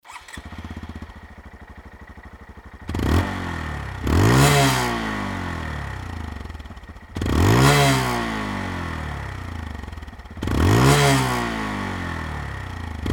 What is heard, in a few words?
monkey125 normal